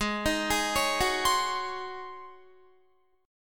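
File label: A flat 9th A flat Guitar, chord; Ab-11th; 16,18,18,18,18,19; Ab11 arpeggiated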